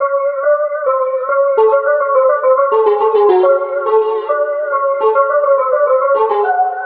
Riddim Melodic Loop F# 140 Glass; Ghosthack; Riddim Essentials Volume 2

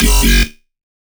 Bass F# Cyborg, Dubstep; Bass One Shots; Ghosthack; Dubstep Sound Arsenal; 50 Bass One Shots

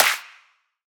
Grime Clap, Dubstep; Claps; Drums; One Shots

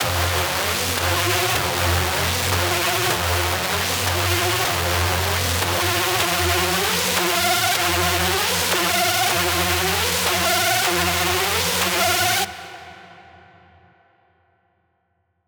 screech loop F min 155; Music Loops; GhostHack

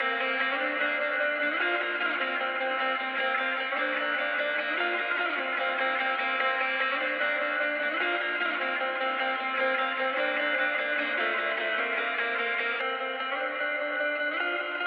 150 BPM G Min Ambient Guitars; Loop Stems; Cymatics

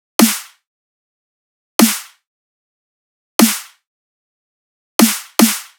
Dubstep Drum Loop Snare, 150bpm; Full Drum Loops (Stem Seperated); Ghosthack; Dubstep Sound Arsenal

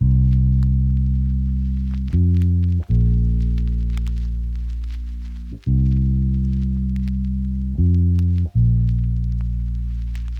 Lofi Melody Loop 85 BPM A# Maj Bass; Melodics; Loop Stems; Cymatics; Eternity

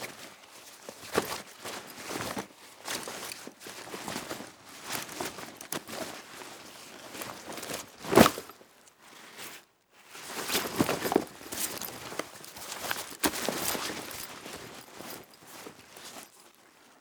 Backpack Bookbag Rummage; Fairlight Sound Lib

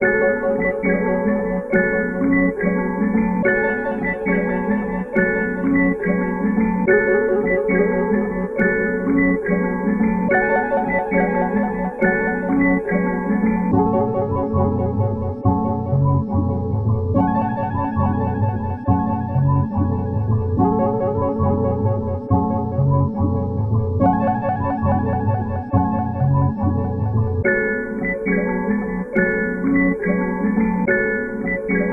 140 BPM F# Min, Melody, Trap; Cymatics; 2022 Melody Collection; Trap Pt 1

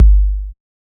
Bass, Dubstep 808 Basses; GhostHack

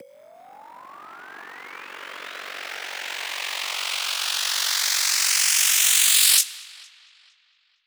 Resonant Tone White Noise Up; House; FX

